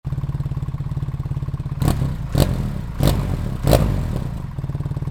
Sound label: BMW R 1300 GS Dynamic mode